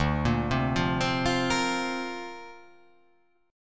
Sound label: D Minor 7th Sharp 5th, Dm7#5 chord; arpeggiated